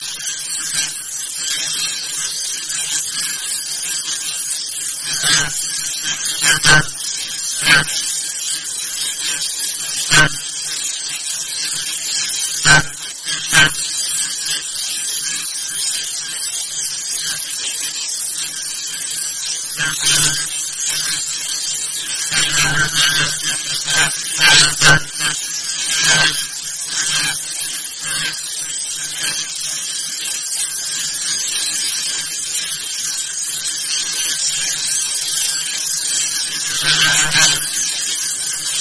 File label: Sun max 700Hz